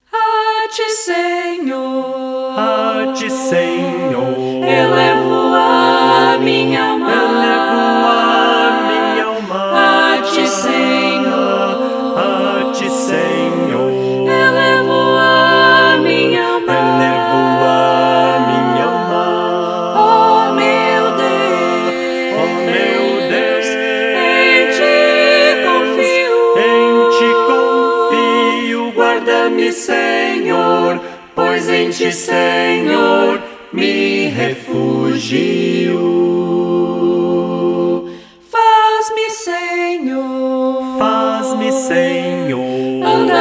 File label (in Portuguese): a ti senhor satb